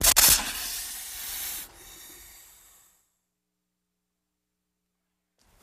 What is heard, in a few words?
Snake Spits and Hisses